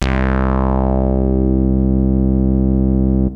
basses

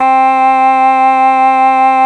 bassoon; reed

